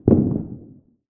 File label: bang, firework